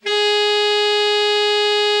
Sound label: alto sax; Best Service; Killer Horns